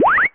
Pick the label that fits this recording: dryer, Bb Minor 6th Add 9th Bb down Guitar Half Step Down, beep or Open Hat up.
beep